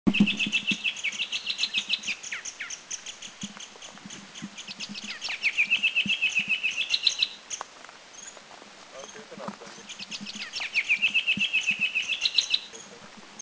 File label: Spot-breasted Parrotbill, Doi Lang; Thailand